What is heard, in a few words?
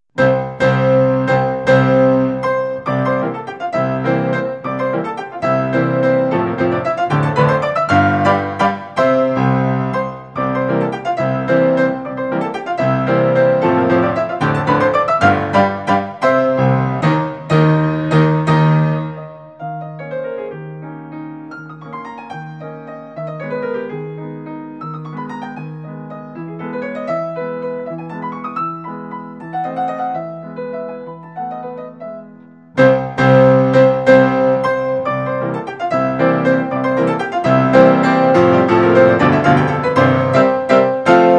Waltz in F major; SHUBERT; Op127